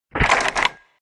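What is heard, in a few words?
Door locked; mecklenburg; rain; HL1-Mecklenburg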